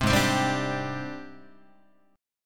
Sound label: G sharp Major Sharp 11th, Guitar; G#M#11 strummed; JGuitar